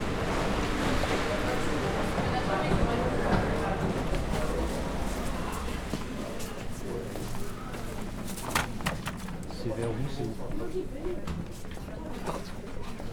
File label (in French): super marche; place leclerc